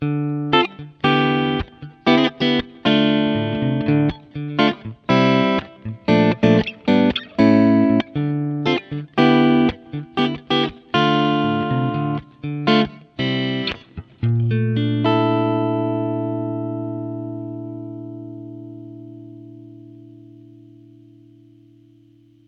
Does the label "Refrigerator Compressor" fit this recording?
No